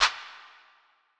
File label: Modern clap, Electro